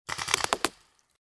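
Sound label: Paintball Gun Impact; Sound Ideas; Guns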